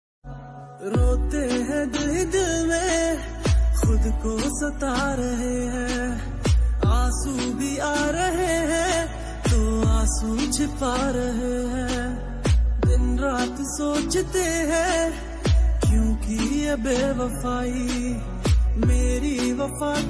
shayari background music sad ringtone instrumental music